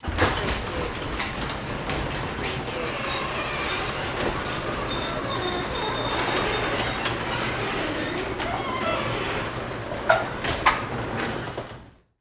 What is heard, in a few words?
Door Garage Open